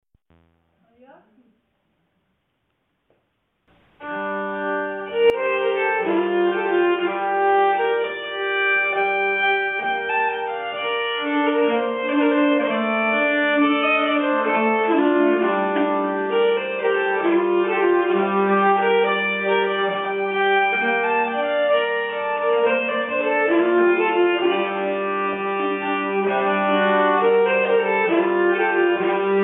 nyckelharpa; En Konstig Fan

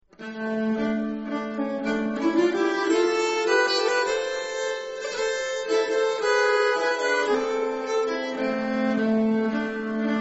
brudmarsch, nyckelharpa

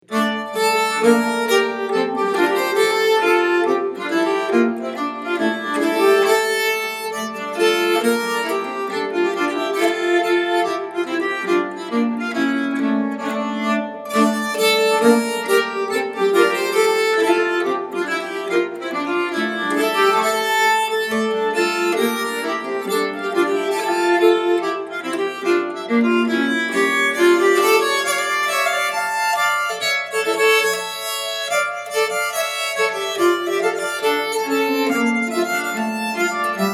schottis fran Halsingland, nyckelharpa

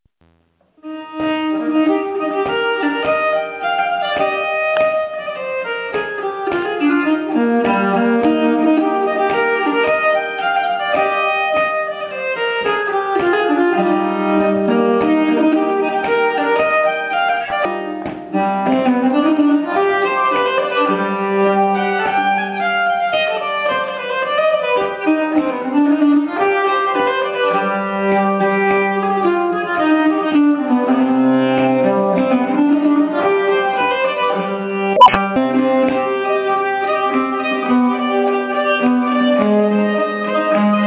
Orebropolskan Rapide

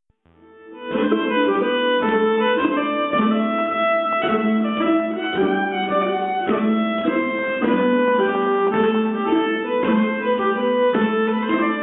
Schottis, nyckelharpa; Schottis Med Ett S

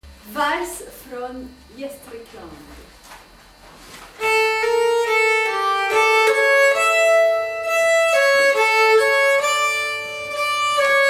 Vals Gastrik

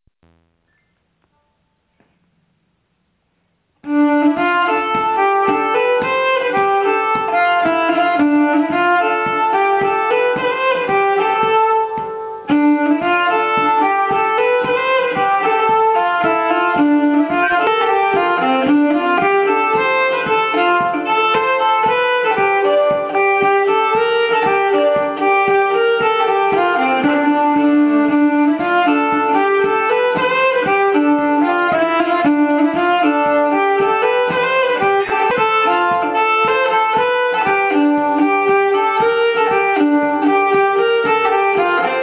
Rapide, nyckelharpa; Troyenne Rapide

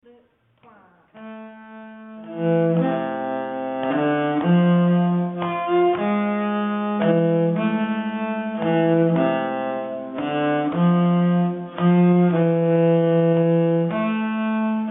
Polska, nyckelharpa; Polska Evert Ahls

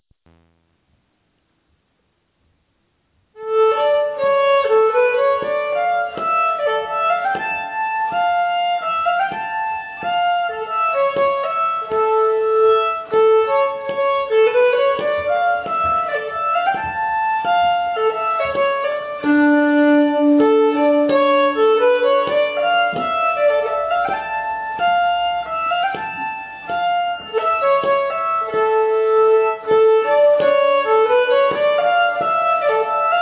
Finnskogs Lent

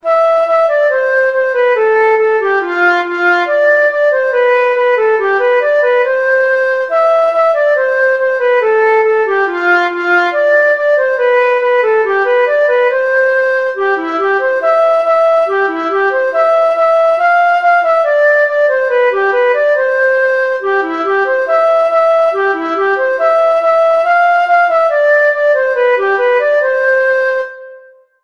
polska; Branvins

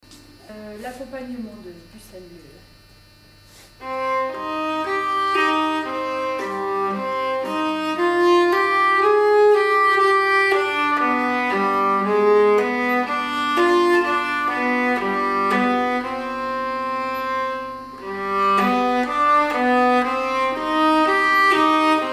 nyckelharpa; byssan

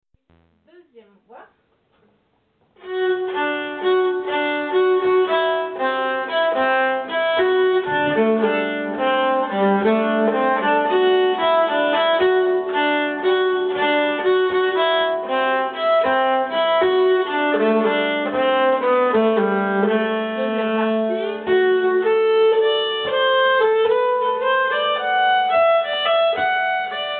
polska, nyckelharpa; polska moineau

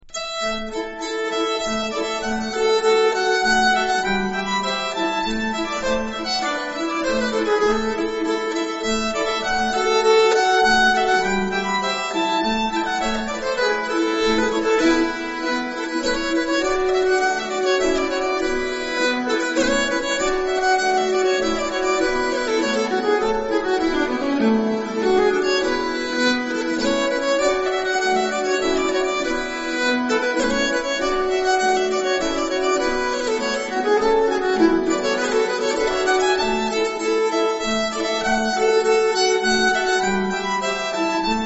polska, nyckelharpa; supreme

